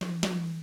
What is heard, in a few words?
BOSSA, Drums